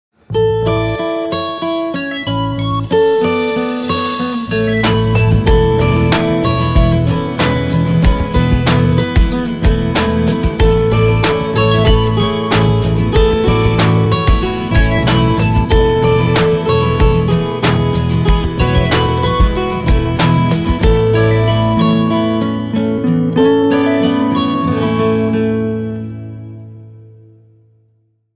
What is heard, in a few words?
ringtong; Gionee V185